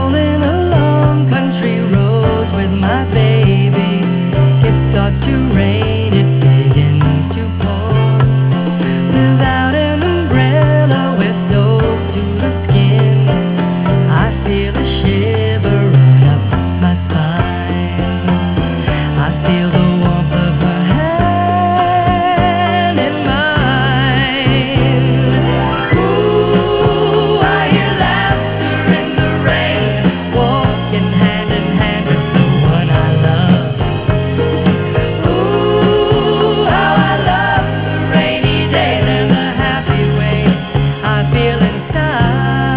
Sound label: laughter